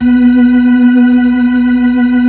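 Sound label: B-3, Hammond B-3 organ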